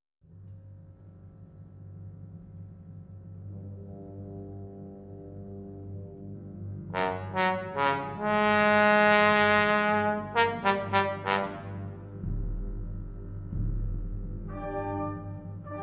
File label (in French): Concert pour Trombone